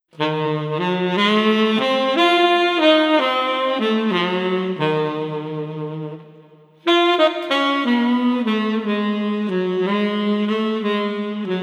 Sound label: Street Sax Dreamy 65